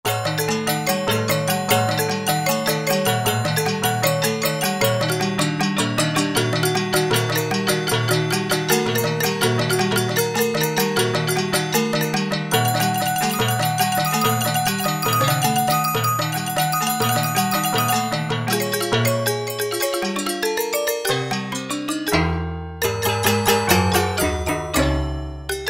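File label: African Metals, Roland JD-990; Factory Patches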